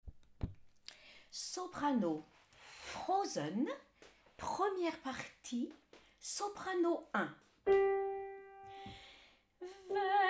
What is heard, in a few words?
Soprano; Ensemble Vocal Chanteval